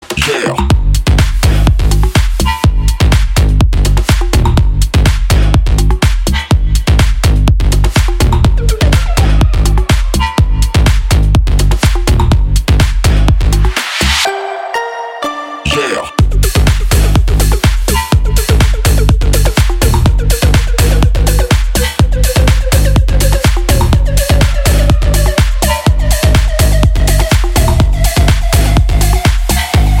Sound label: Bass Drop (Clubmix); MIUI12